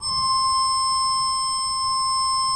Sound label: SWL C5, Cathedral Organ; ILIO; Propeller Islands